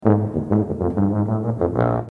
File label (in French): tuba son